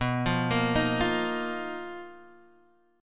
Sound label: B Major 7th Flat 5th B Guitar Half Step Down